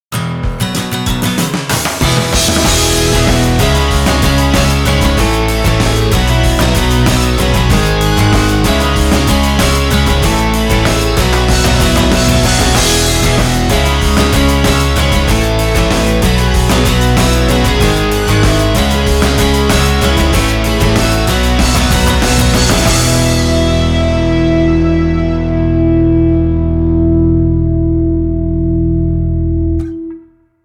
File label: movie rock